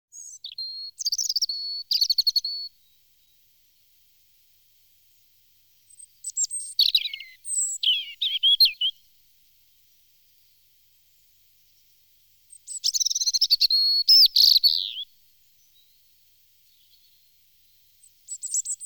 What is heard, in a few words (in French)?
Rouge gorge, chants d'oiseaux; Jean C. Roche